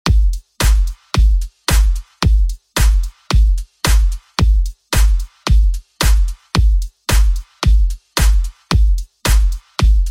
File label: 1980 Redrum, 111 Bpm